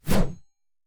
Blade Swing; Scrap Weapons; Zomboid

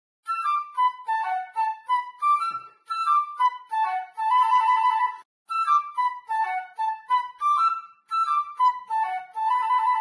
Flute; Zomboid; Lifestyle

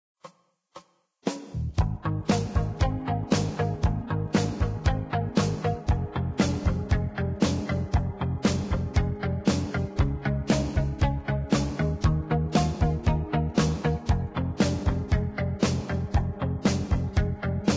Keytar; Zomboid; Lifestyle

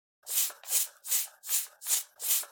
Pump Inflate; Zomboid; P4PumpPury

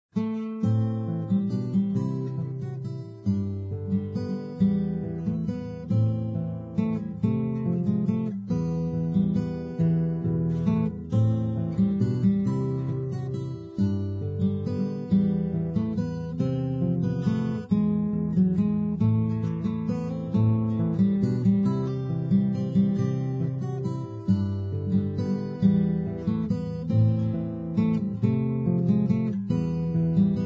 guitar acoustic; Zomboid; Lifestyle

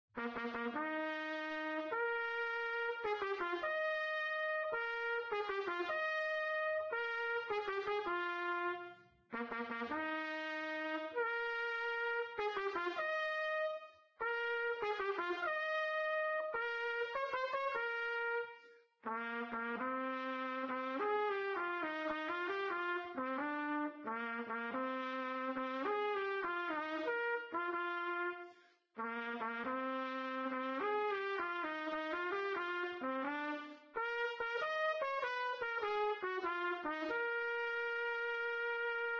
Trumpet; Zomboid; Lifestyle

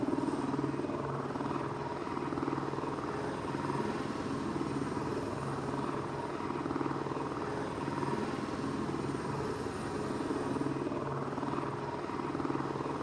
heli loop

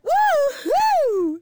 Woman Woohoo; Zomboid; Lifestyle

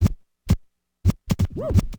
scratch, DJ Booth; effects

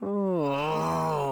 Man Frustrated; Zomboid; Lifestyle

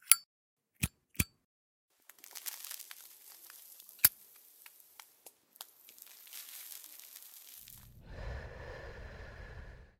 smoking lighter; Zomboid; Smoking Sound Overhaul

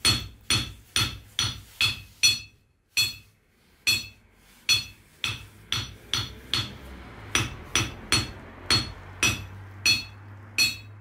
Metal Sound, Metalworking; Zomboid; Real Metalworking

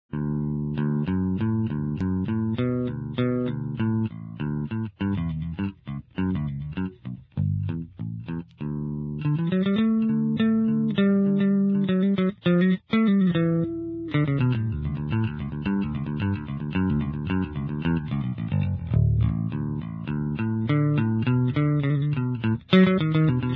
Guitar Electric Bass; Zomboid; Lifestyle

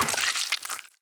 zombie death; Zomboid; More Zombie Death Sounds

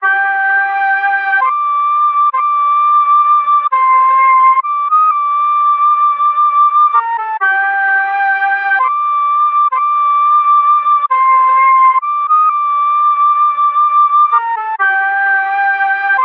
flute; Zomboid; EntertainYourself

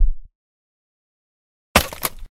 spoon mining sound; Zomboid; DynamicMining

